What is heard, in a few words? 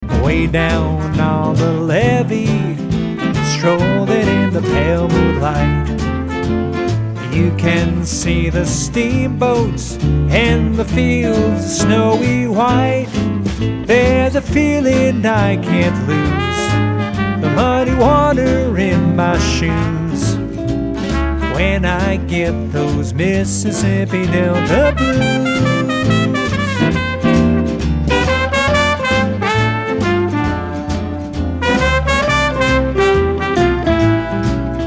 Mississippi Delta Blues